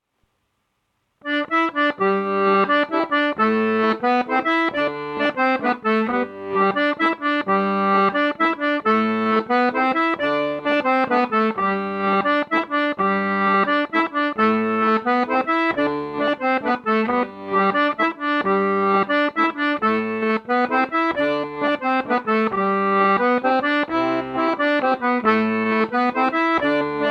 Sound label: mazurka session